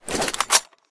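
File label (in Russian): быстрая резкая перезарядка пистолета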